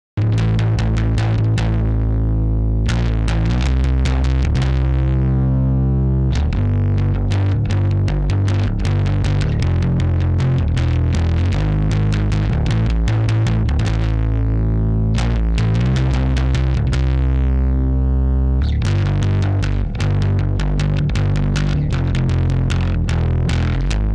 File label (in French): basse satu